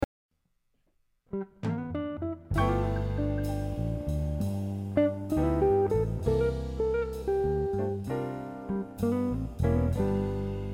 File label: Slow Blues